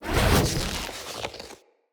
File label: Casting Device Projectile Whizz By Heavy; Weapons; Player; Fenn; Casting Devices